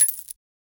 metallic sound effect of, currency

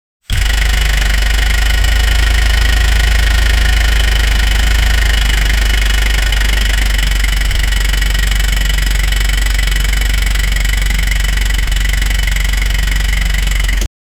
8.2 liter; 94 valves; automotive

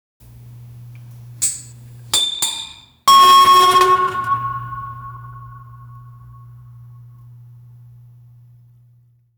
a sound of a full, beverage

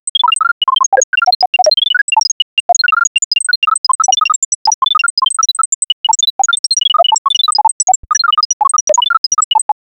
retro munch sound like a, pixel art

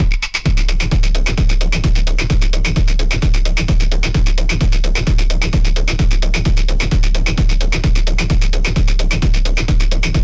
melodic techno pluck loop

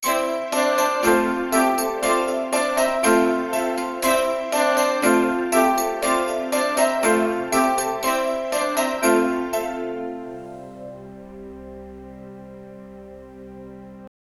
create upbeat playful orc, children's

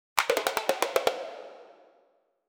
winning champion sound

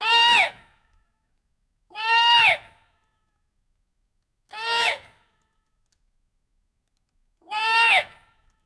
camera glips sound and pe

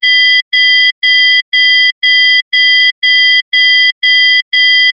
a sound effect for a, timer

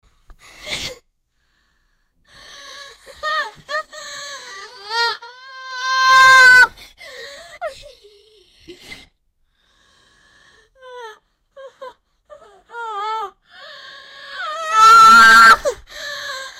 a schoolgirl frightened a, distress

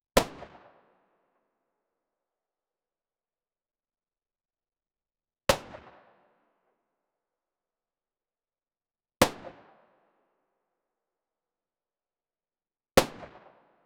shooting far away; guns

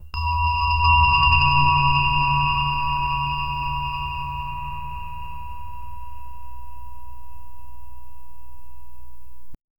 click sound; mythical

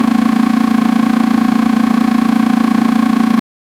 airplane piston engine

